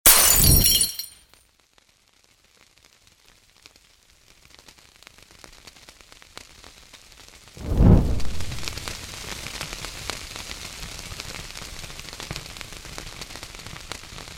brosili butylku s benzinom i gorjashhim fitilem

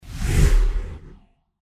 poryv korotkij; Звук ветра; Звук ветра для перехода в видео или для монтажного перехода в аудио монтаже

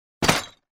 zadeli jashhik s posudoj

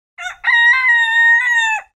petuh

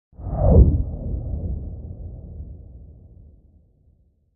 Zvuk perekatyvania dlia smeny kadra

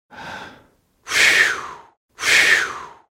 sumasshedshij dumaet chto on veter; Звуки сумасшедшего; идиотские звуки, которые издают психи в фильмах страшилках

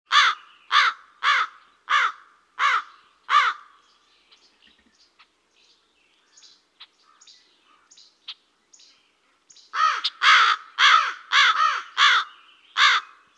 krik vorony ej otvechaet drugaja

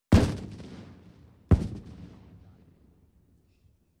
Protivotankovoe reaktivnoe ruzhe

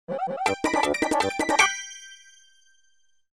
muzykalnaja smeshnaja otbivka

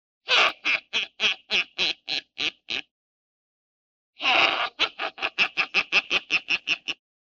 seh vedmy kotoraja zamanila kogo to v zapadju; Для Хэллоуина; смеха ведьмы; Коварный злой смех старой ведьмы, забавный смех

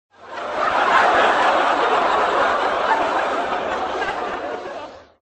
smeh; ситком; Звук смеха для монтажа

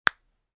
zvuk hrusta odnogo palca

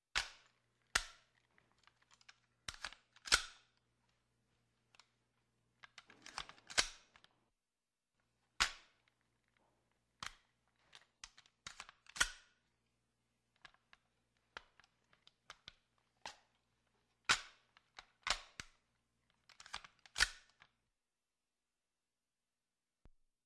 Avt ruzhe zaryadka

